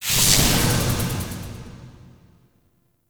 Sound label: Drone Spawn; Warframe; Veilbreaker; Venkra